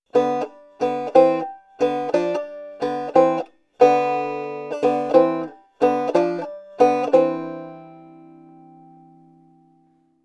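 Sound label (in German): Saiten dämpfen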